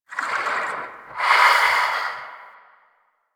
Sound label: Vocal Breathe; Warframe; Duviri; Dax; Vocal Set A